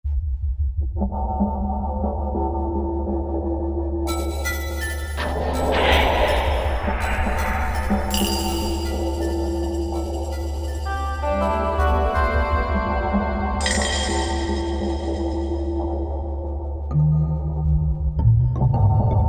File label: Korg MSC-14S Sound Effects; Factory demo songs; Prophecy